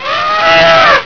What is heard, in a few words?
Elephant; HyperStudio